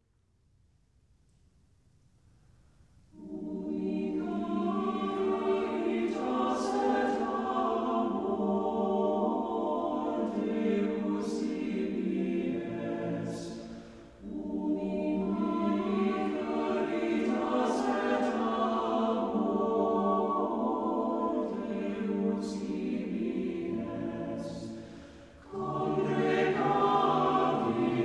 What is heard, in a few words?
Ubi Caritas, Chamber Singers